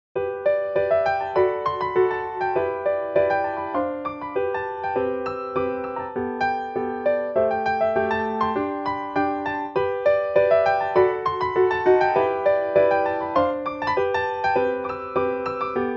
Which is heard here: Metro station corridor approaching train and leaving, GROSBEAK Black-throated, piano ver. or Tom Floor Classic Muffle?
piano ver.